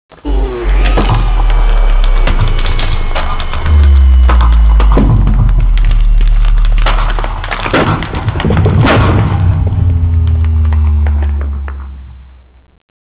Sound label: death